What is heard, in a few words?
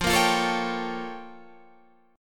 Bb Minor Major 13th